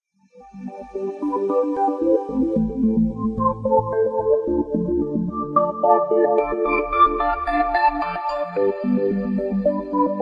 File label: Mystical